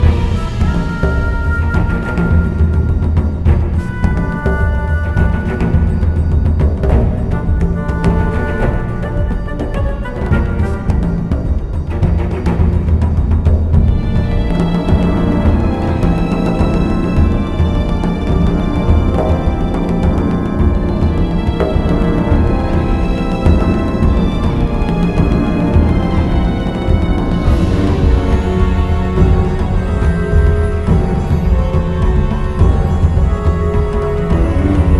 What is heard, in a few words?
Music; legend of the guardians the owls of ga hoole 2010 nds